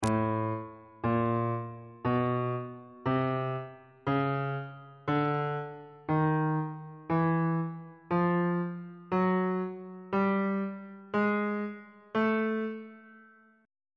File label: a phrygian mode chromatic scale 1 octave on bass clef